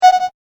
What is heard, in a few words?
Triple Beep Sound; Beep Sound Effects; electronic sound effect